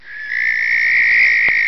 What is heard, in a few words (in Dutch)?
kerkuil